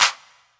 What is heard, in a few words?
clap b key, Royality free hand clap tuned to the B note. Loudest frequency: 4339Hz; percussion single hits one shots